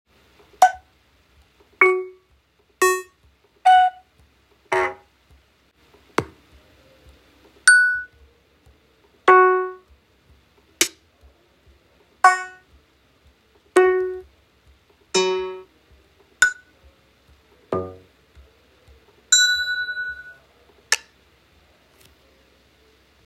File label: Instruments Base Sounds Recorded; Minecraft-noteblock-music-generator; ExtraSounds